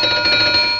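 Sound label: alarm clock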